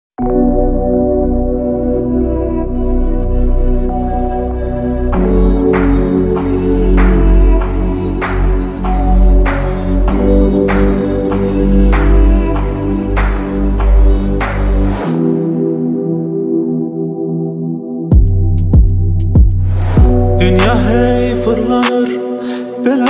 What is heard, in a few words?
azeri bass music